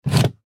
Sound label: Toolbox Pick Up Plastic Empty; Hand Tool; Industry; Tools; Blastwave